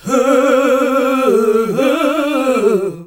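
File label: Gospel; Best Service Gigapack 1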